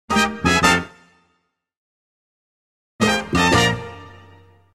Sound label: Latin jazz band hits; Music effect; Hollywood Edge Sound Designers Tool Kit